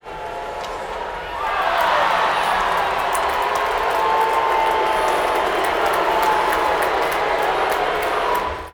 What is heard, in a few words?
crowd boo; score